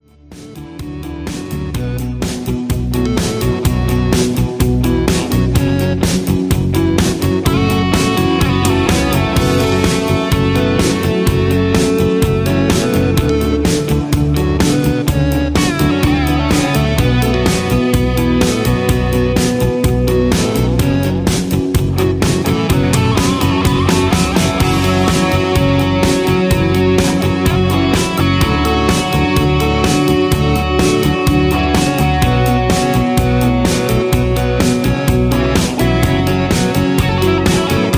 Instrumental with Leads

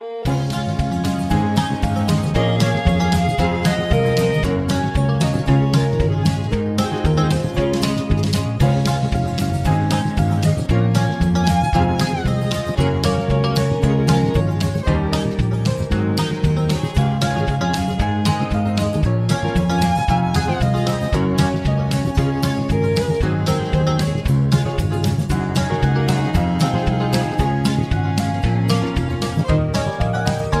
Low Key

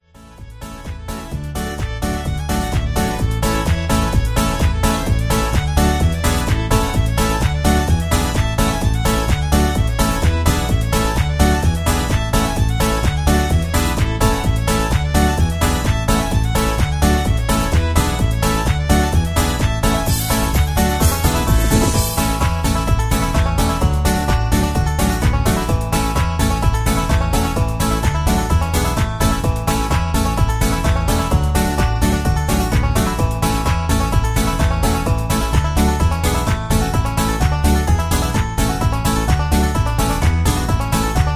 Patter

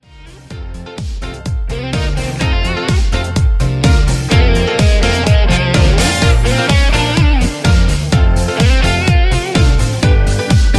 Patter

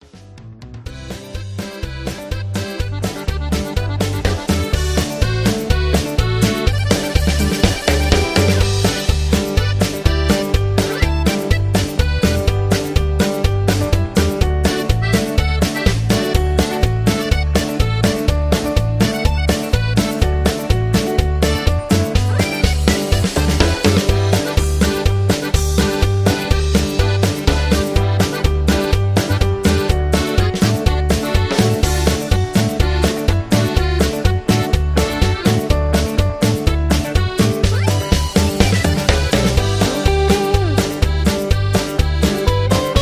Cumbia Instrumental

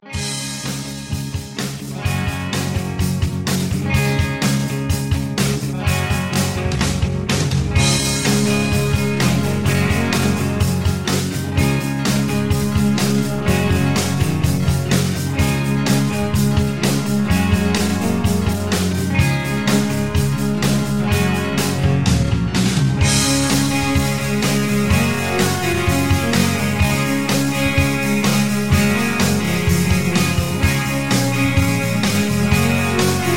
Instrumental Higher Key

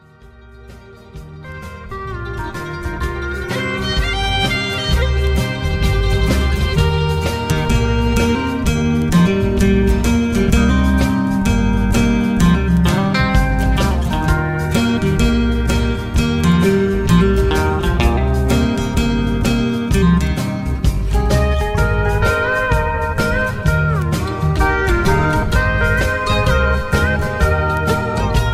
Low Key

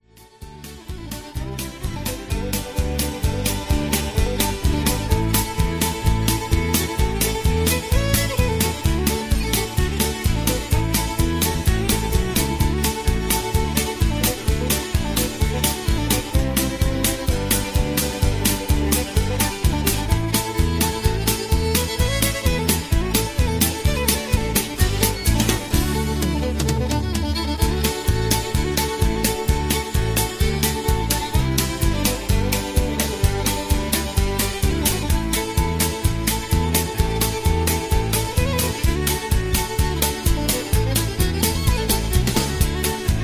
Patter

